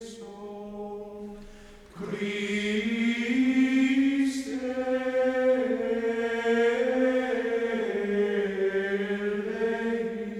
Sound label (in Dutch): Kyrie pro defunctis ( Gregoriaans ); Kyriale